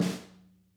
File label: SNAR, Interactive Drum Kits